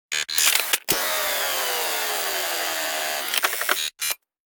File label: module move